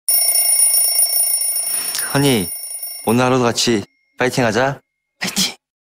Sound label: Alarm